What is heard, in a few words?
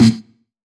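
909 SD amp, snare drum; Goldbaby Tape808 & Tape909; The Tape 909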